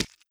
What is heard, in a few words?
hit